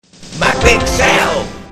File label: fanfare default